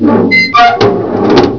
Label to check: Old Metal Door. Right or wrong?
Right